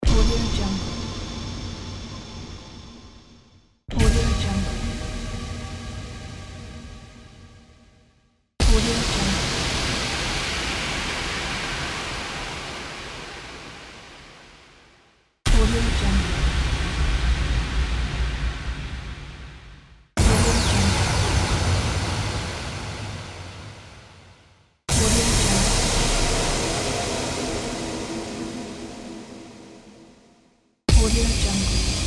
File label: epic crash impact